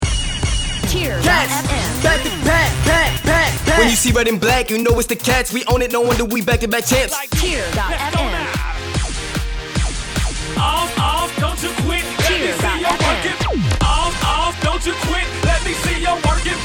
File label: Intro w loop; Franklin HS